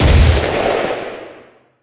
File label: Sci-Fi Weapons-Sniper Rifle Single Shot